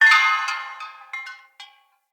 Bell Swing Long; Enemy; Corrupted Sproutfolk; Foley

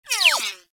Laser Whizzby; Warframe; Bullet Impacts; Whizzbys

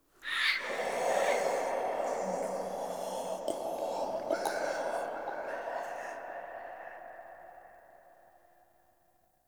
Room Whisper; Warframe; Entrati; Dead End Secret; Dante Unbound

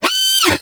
Snow Critter Vocal Pain; Hunting Critters

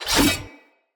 Dagger Whoosh; Weapons; Dendrit

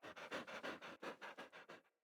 Puppy Idle Pant; Enemies; Vocals; Kubrow

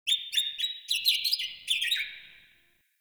Tree Critters Happy Calm; Warframe; Duviri; Ambience

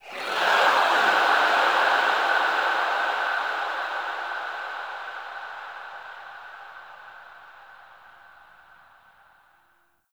Texture Distant; Ambience; Warframe; Cephalon Weave

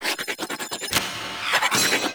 Throw Glaive; Warframe; The War Within; Mountain Pass; Teshin; Cinematics

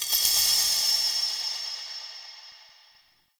Interior Orbital Station Metal Shake; Warframe; Tau

